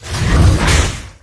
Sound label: Vehicles; SFX Vehicles Goliath